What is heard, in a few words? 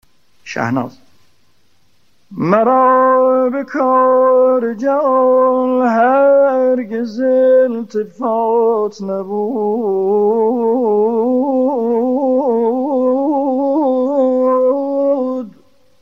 shahnaz, shour